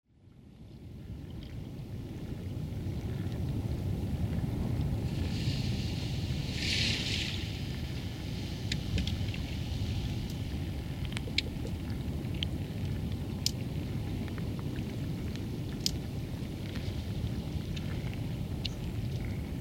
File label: seapool tenerife; Recorder: Sound Devices 744 (24bit / 48Khz); Mic: Aquarian H2a-XLR; Long version